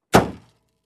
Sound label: Car Door Close